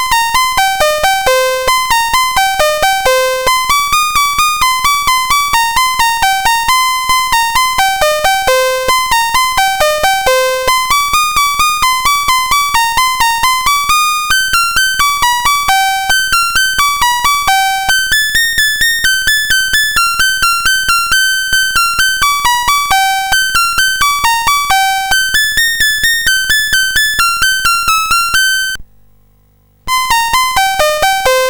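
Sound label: Pengo BASIC, MOS 8580 SID chip music, games; CSG8580R5; hvsc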